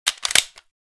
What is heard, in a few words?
Assault rifle mag insertion reload sequence